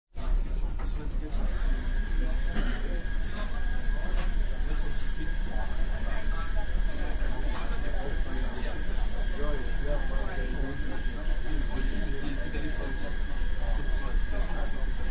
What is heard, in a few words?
bluebridge ferry communal area